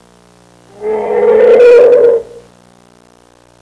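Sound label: elefanti elephants; animali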